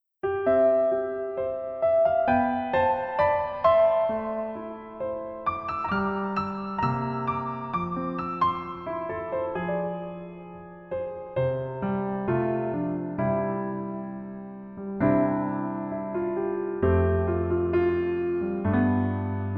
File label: Piano ver, 멜로디MR